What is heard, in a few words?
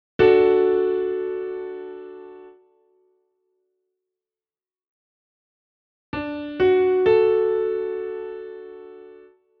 akkord